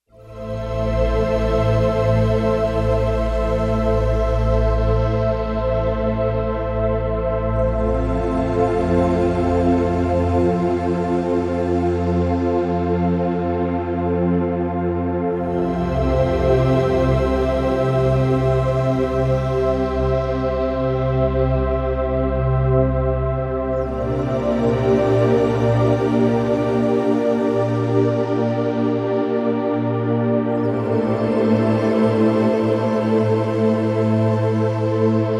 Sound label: Long Rain; Digital Synthesizer